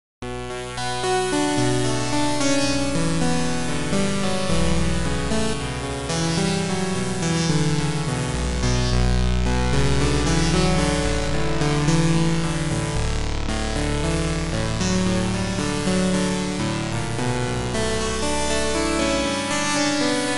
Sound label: The Korg PolySix